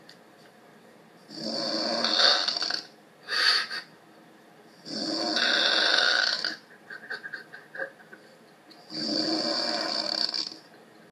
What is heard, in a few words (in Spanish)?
ronquidos muy fuertes